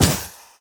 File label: arrow; dota; medusa